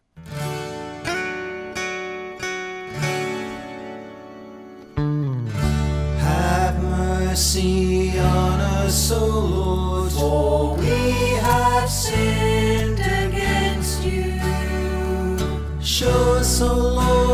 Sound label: Have mercy on us vocal